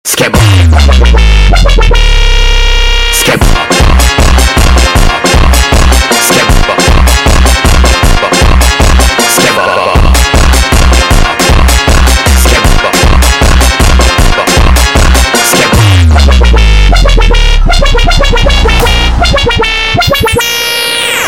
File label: Funk sped Up